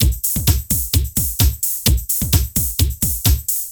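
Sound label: drum loops, right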